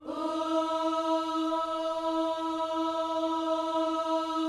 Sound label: OOS E 4 C, Gospel; Spectrasonics; Vocal Planet